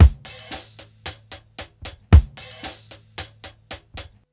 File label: BEAT -L, Roots Disco; USB Soundscan vol.32; AKAI